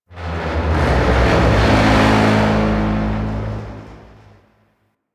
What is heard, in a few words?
Destruction Texture; Warframe; Corpus; Railjack